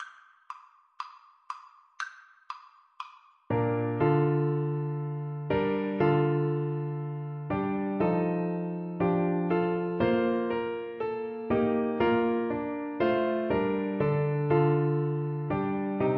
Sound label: flute